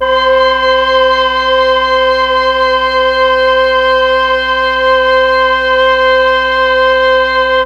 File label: mellotron woodwinds c4